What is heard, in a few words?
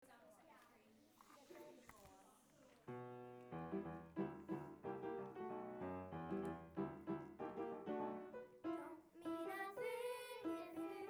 alto